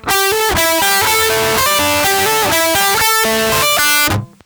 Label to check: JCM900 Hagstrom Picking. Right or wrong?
Right